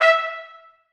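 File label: trumpets staccato e3; Sonatina Symphonic Orchestra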